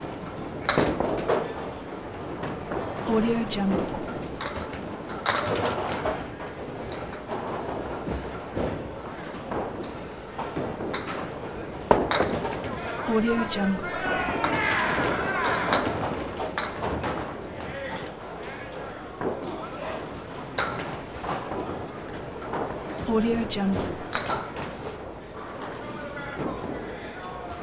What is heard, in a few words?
bowling ball throw roll down alley hits pins